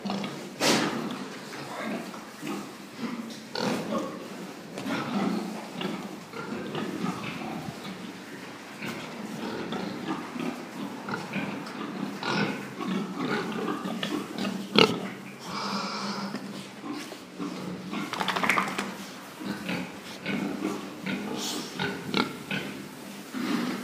Mastschweine am Arbeiten

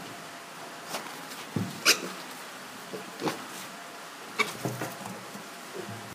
Ferkel schreckt sich

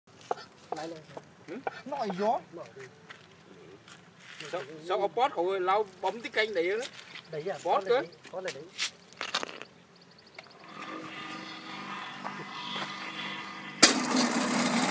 Schlachtung Kutter

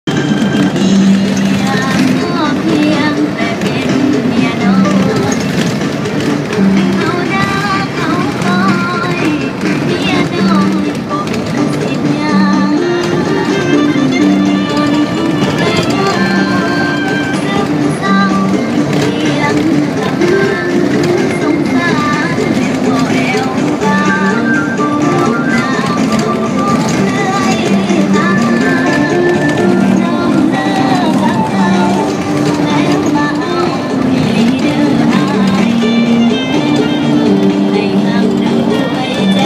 Bus nach Hatsa

